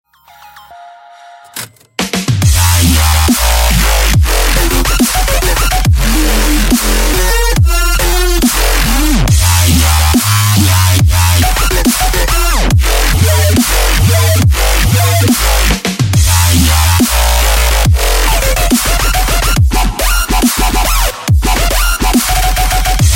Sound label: chime